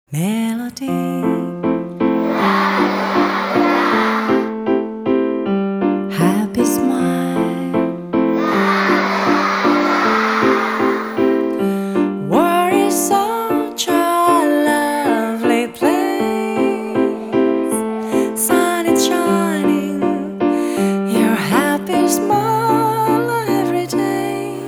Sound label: melody